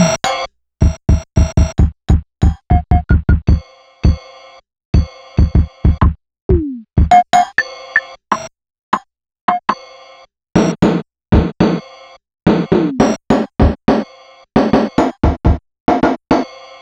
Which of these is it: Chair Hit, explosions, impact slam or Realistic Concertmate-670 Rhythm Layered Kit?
Realistic Concertmate-670 Rhythm Layered Kit